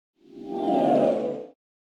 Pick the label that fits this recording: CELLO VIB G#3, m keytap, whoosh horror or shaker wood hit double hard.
whoosh horror